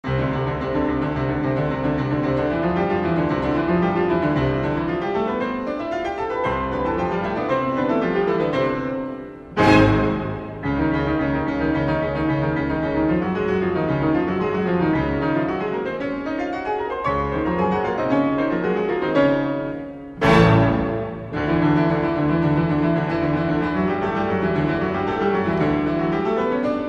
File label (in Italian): pianisti